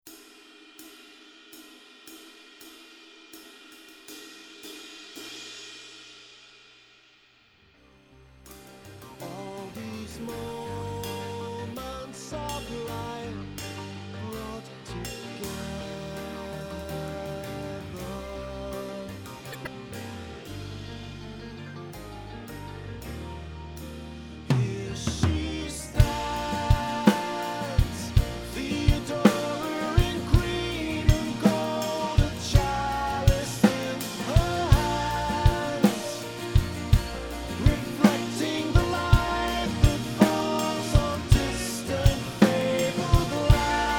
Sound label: ride cymbal